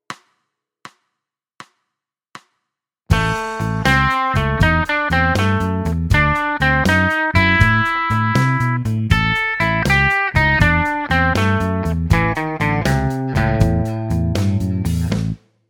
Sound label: blues